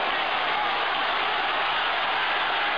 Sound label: roar; PC Gamer 34